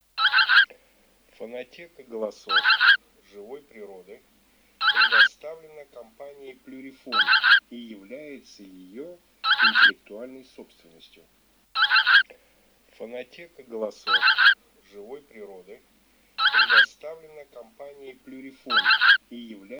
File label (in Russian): Гуменник к клюв Oche Zampe Rosa